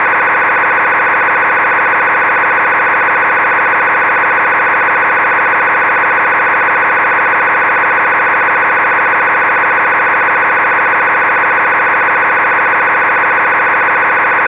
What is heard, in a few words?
MSK144 USB filt